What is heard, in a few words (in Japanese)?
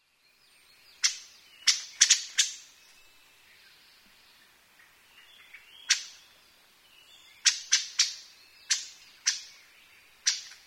akahara; アカハラ